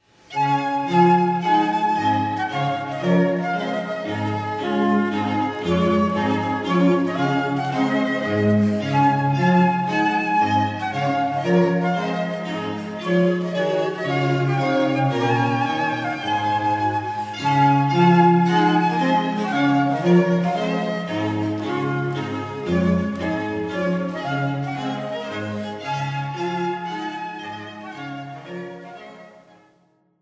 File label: trumpet